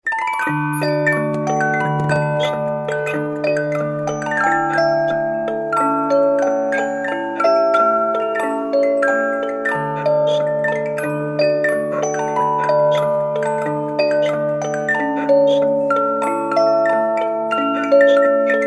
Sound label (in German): Kleine Walzenspieldose; Boccaccio